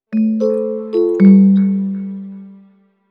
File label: ping